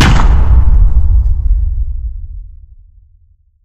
rat maze down stop; Source Engine; Portal 2

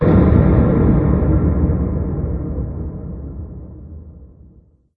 amb boomer; source engine; portal2

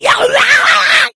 rage at victim, infected female; Left 4 Dead 2; Source Engine

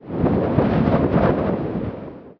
wind hit, ambient

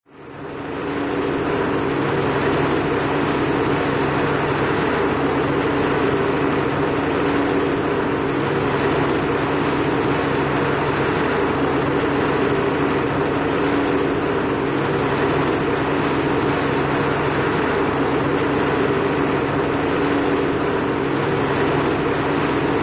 motor, generator running steady; cartoon

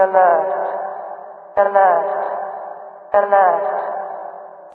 citadel alert; video games; source engine; episodic

